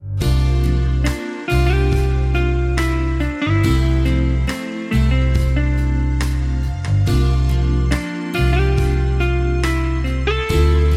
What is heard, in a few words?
guitar perfect